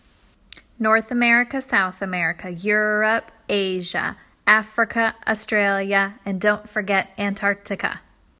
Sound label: Continent Song